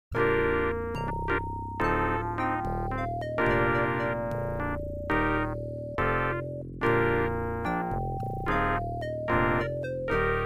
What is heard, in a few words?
jingle; Xmas88